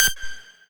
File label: lead